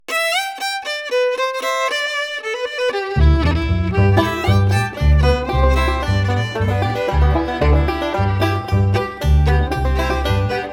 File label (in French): violon et basse; cripple creek